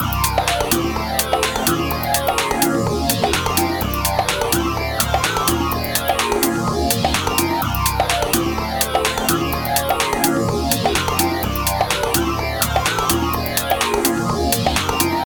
The two raw sounds mixed.; raw percussion loop

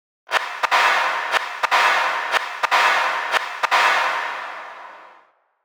Second noise gate (right reverb).

